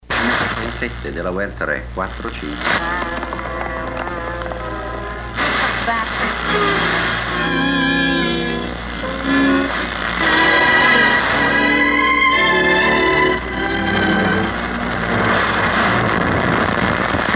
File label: BAND SCAN FM